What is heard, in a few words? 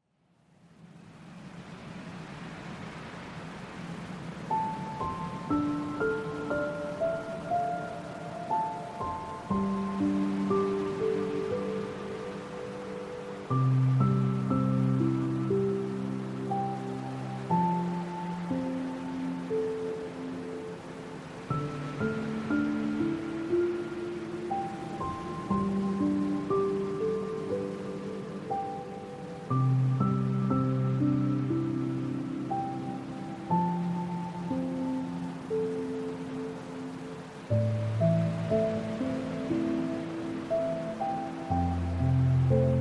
Relaxing Music for Sleep Ocean Sound